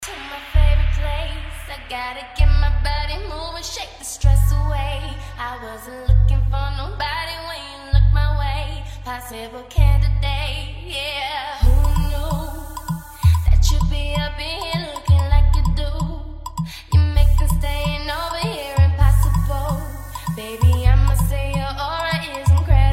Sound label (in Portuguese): esp de cavalgada; adales auto som; hb20 panther black